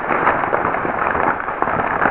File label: fire; Amiga Soundtracker Sample Packs